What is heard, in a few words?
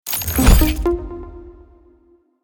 Podcast intro outro sonic branding